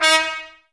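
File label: Brass trumpets D#, Mute; Miroslav Vitous - Woodwind Brass Ensemble; Tps Mute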